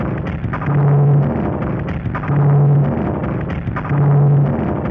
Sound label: Crude Tape Loop, Tape delay; radiosonics